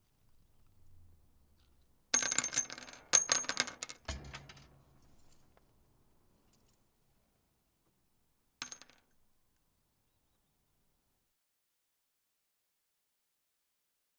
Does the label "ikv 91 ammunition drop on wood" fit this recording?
Yes